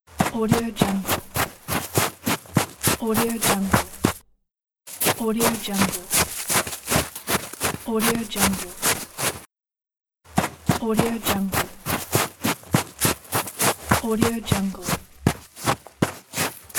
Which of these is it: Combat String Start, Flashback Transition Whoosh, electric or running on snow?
running on snow